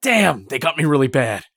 hurt light; Escape from Tarkov

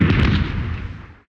Fail Landing, Aircrafts; DCS

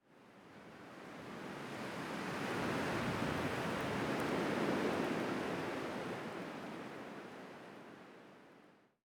ambient; World of Tanks

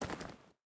footstep boots gravel walk; Rust

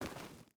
footstep boots gravel walk; Rust

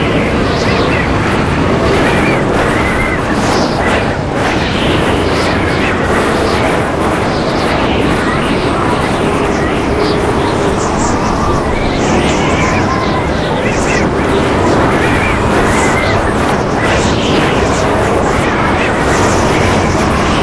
vehicle snowmobile wind loop stereo

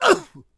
Hit Vox; Civ4 warlords

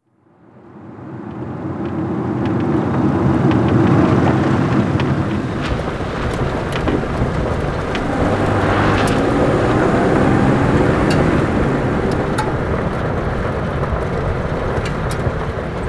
car move; coup; Call of Duty Modern Warfare

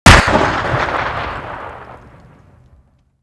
Inf Weapons; Red Orchestra 41-45

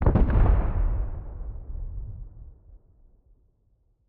satchel charge explosion distant; Rust

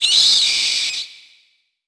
bat; World of Tanks Blitz; hangar halloween 2019

